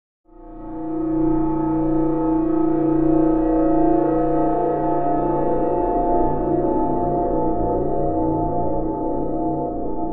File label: Bed Ominous Metallic